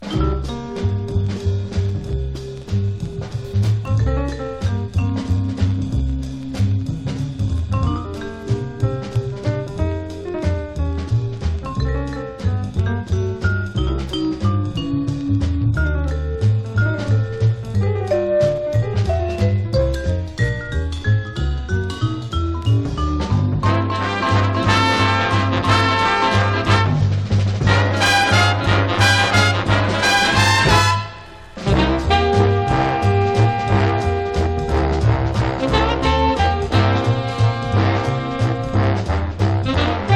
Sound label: jazz waltz